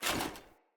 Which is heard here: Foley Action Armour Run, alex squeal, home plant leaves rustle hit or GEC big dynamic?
Foley Action Armour Run